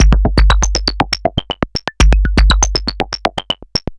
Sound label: Anabeat Mix, Beats